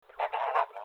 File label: goose